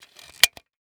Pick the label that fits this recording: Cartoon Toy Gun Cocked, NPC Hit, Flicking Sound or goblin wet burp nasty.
Cartoon Toy Gun Cocked